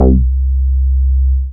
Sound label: BAS MINI, MOOG; Sound & Vision - Gigapack II CD 1